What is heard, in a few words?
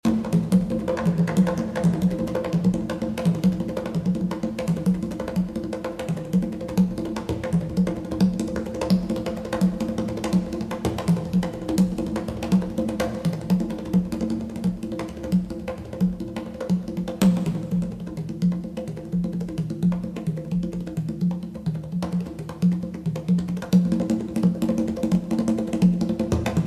Conga Solo